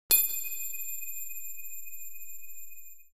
cartoon pling; TECKNAT

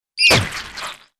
impact splat; BRYTLJUD; FUKTIGA

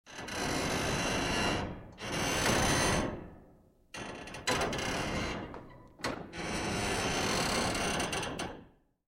creak metal; METALL; KNARR

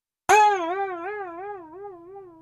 cartoon twang; TECKNAT

